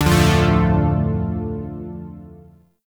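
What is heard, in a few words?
Shawzin Major Chord; Chord B; Chords; Warframe; Zariman Shawzin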